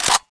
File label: ssg bolt release, weapons; csgo